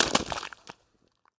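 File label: single distant water bloop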